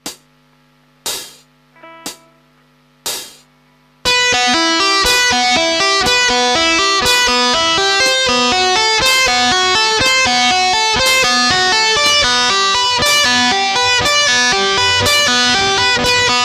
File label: tap, advanced techniques tapping